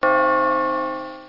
bell; ROSETTA

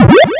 insert coin; Touch Games 2

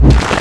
mutant; Wanted

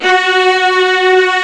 Violas F#2

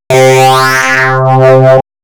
sweep

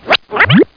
cartoon; animwave

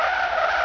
grip; corrida; runout

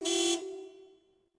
CARHRN2, vehicles

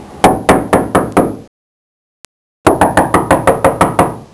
Knocking

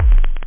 pumm, drums, technobd

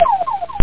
scifi

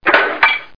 plane nose door; DR BRAIN; BUGS

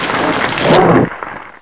out of road; wreckage

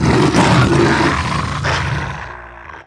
mau die; DAoC; Toa; Gamestar

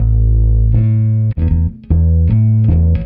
Reggae; ACID MUSIC; Bass

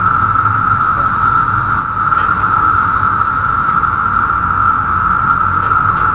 ambient; Jump Zampoli

